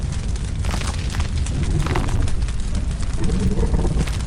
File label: Forest Giant Burn; lethal company; ambience; windows